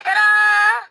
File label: tada; pajama sam